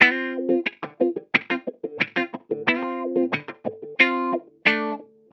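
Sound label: Hip Hop Guitar G major; Digidesign; Pro Tools; Pro Tools Essential Loops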